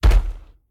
foot land; smite; zhongkui

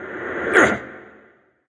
grunt jump; smite; xingtian

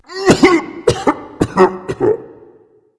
clear throat; smite; ares

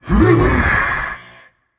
breath, voicepack; smite; jorm

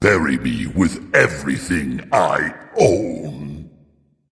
death dragon; fafnir

